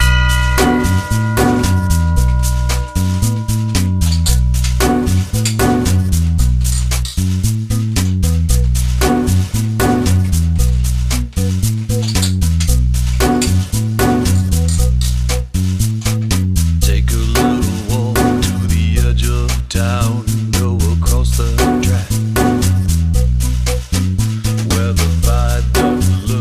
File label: Mobile Ringtone